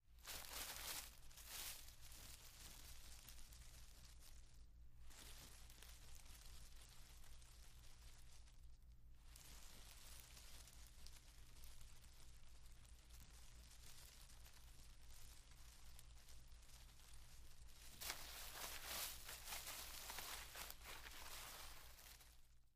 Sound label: Footsteps Eerie